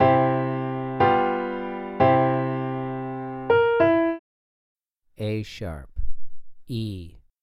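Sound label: 2 Note Melodic Major a#3 e3, piano; melodic ear training; melodic ear training for musicians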